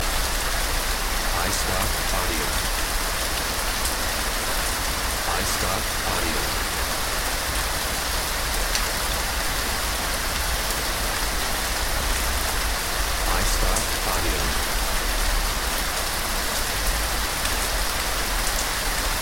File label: hard rain